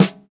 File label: tight low end snare one shot F sharp key, Royality free steel snare drum